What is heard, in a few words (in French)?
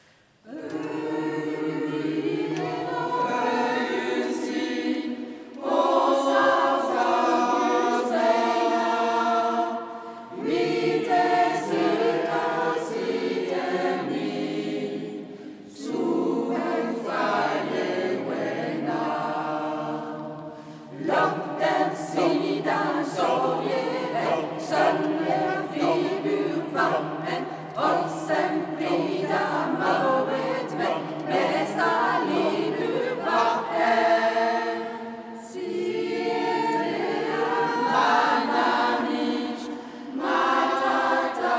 Chant islandais